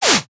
whip whoosh

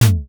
footstep wood; daily life

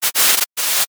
paper crumple light; daily life

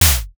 footstep gravel

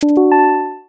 game powerup

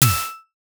camera flash air

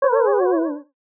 eyecatch comedic tilt

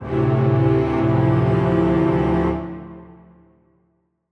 jingle game over

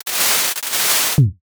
playing cards shuffle

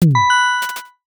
cash register bright

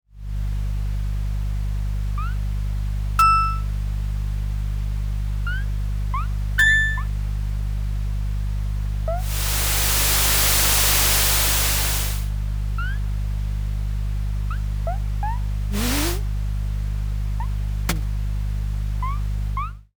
kitchen room tone

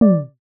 caption soft pop; buttons ui

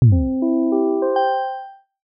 logo podcast warm open

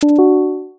like pop

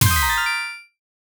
glass break shock; horror sf

